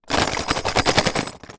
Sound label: Foley Metal Clamps Rattle Plastic Tub Short Fast Rattle